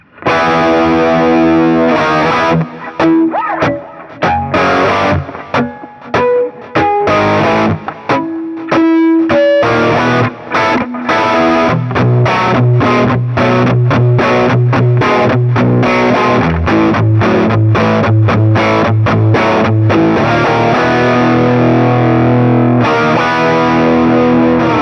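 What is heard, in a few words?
Guild SF III Super Tweed, guitar; 2016 Guild Newark Street Starfire III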